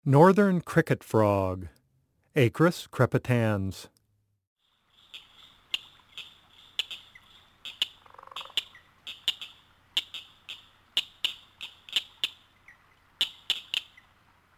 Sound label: Northern Cricket Frog Call